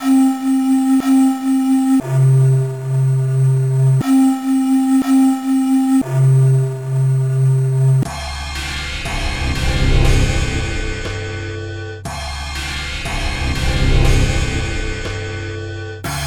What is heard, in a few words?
drone